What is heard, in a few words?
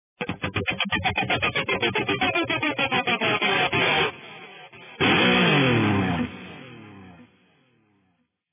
Beta Riff; NIU N109 Niutek